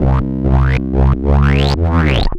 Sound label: Roland C Line, Roland S100